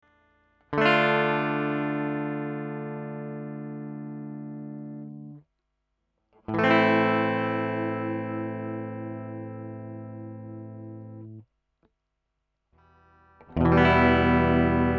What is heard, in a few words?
1173 DI Clean